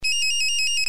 Motorola Startac

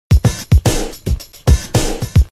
Drummer

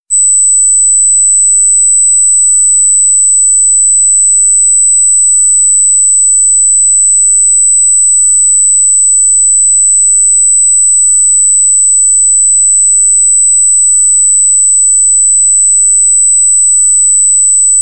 450000 Mhz

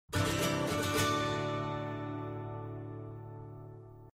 Guitar riff SMS

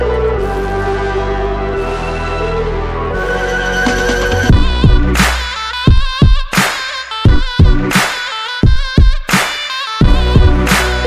Zurna Beat

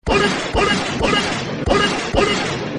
Bullet Bullet

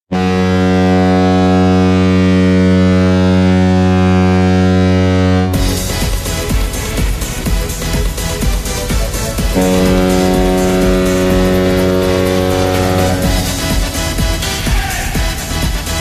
Goal horn; Sharks

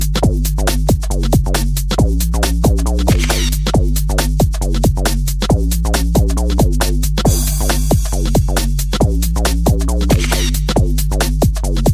Pure Garage

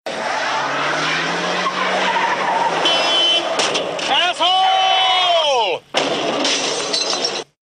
Crash

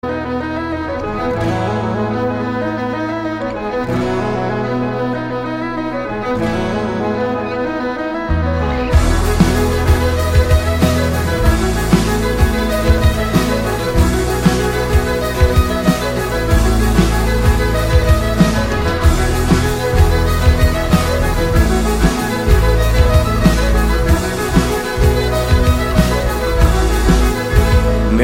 sweet violin tone

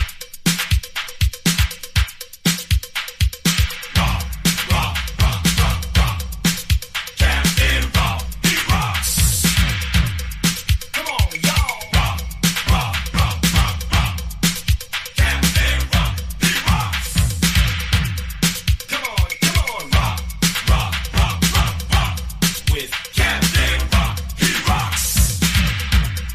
Rock

